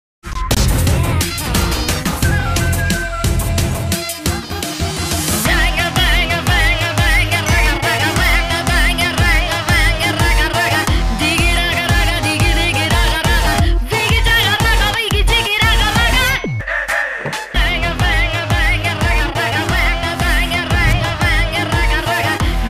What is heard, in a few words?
teaser bgm ringtone; adbhutham